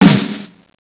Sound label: SNAR BRST